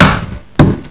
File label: RUB STAMP